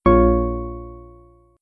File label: Critical Stop; ReactOS